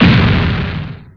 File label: explode